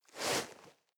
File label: nylon leather soft short